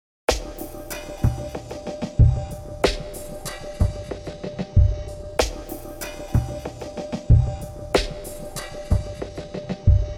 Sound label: Jazzy Groove